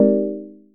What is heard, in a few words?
incorrect